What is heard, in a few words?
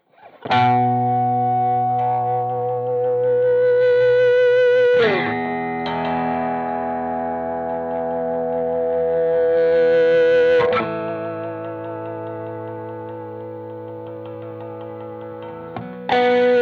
Stratocaster Honk Machine Marshall 2203 Honk Effect